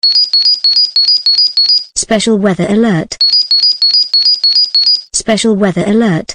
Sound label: weather alert